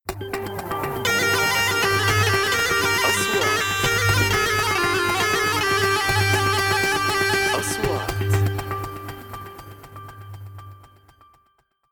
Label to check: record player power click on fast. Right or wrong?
Wrong